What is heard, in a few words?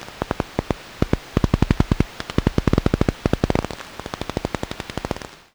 Whiskered bat; Jules Agate